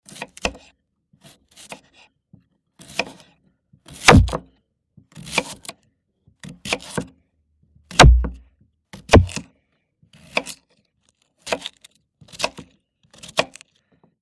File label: Slicing potato